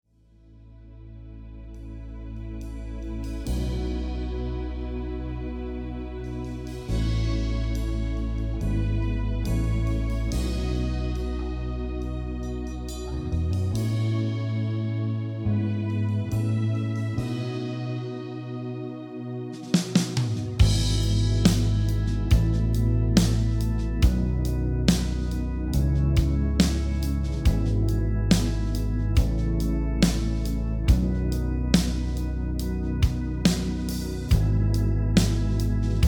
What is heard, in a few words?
rhythm